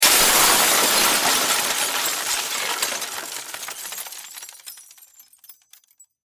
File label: Impact Debris Glass Plastic Large; Materials